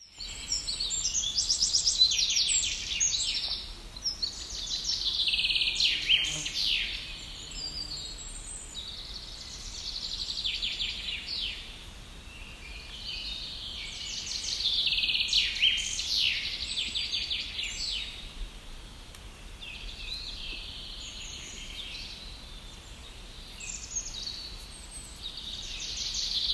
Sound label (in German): Vogelstimmen